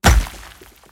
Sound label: rock collide; egypt stone war online